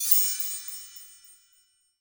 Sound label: Achievement Pressed; virtual city windows gamerip 2009